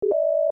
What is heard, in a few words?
computer beep